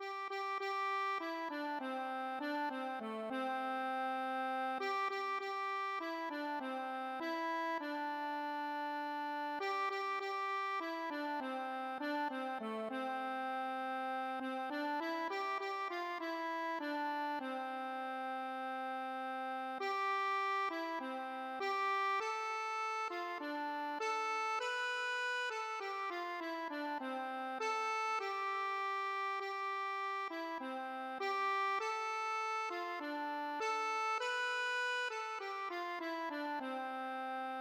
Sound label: accordion